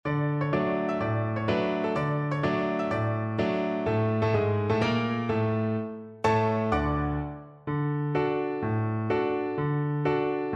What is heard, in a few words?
Viola